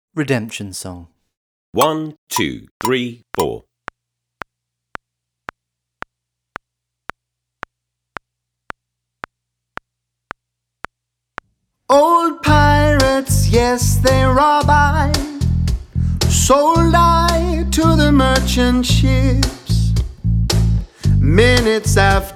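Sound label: (backing track click)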